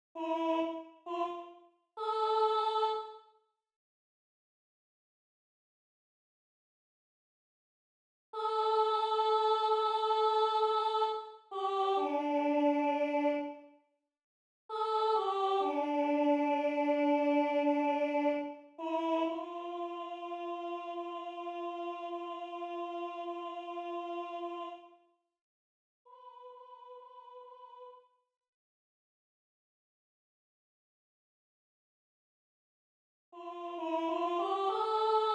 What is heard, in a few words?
soprano